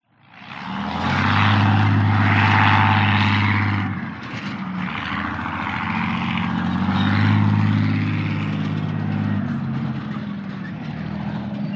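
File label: warfare tank matilda mark 2 1939 manoeuvre distance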